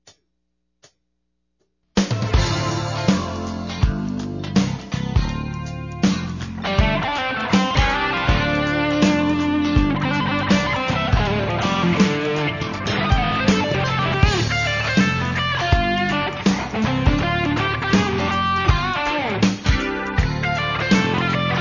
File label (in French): Jazz rock fusion, PRS Cu22; guitare